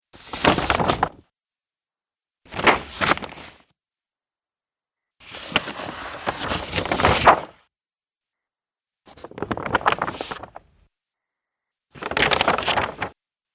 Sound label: War Map Paper Movement; Paper Sound Effects